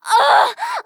Scream, FVoice; rimworld animations

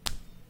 Clap; rimworld-animations

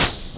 Crash; DoukutsuNikki

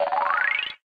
collect; notsanequarium

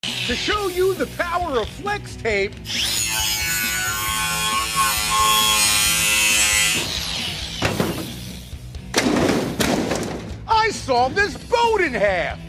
boat

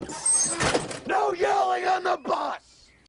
bus

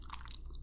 Slimy, Slime; rimworld animations

